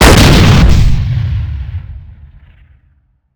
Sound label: 105mm shot